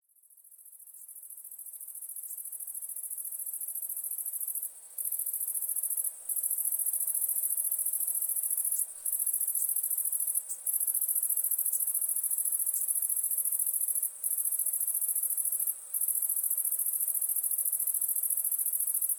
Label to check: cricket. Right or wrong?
Right